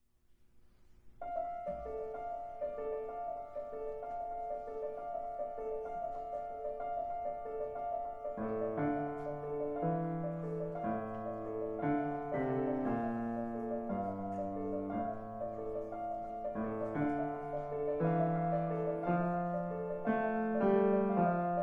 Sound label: Carol of the Bells piano duet